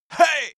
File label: male attack, schreie